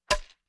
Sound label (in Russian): udar volanchik zadevaet derebianuyu chast; Звуки игры в бадминтон